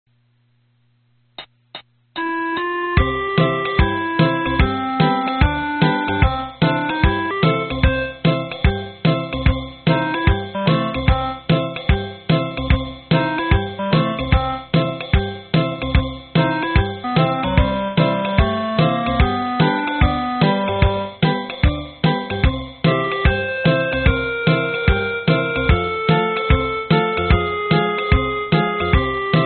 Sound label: acc only